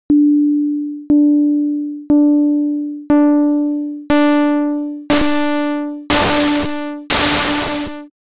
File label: feedback